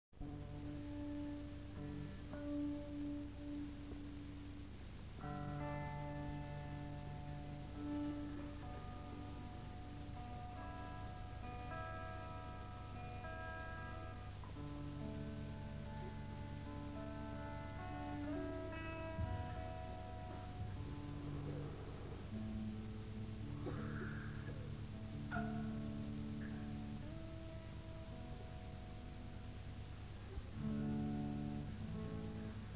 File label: Tuning & Soundcheck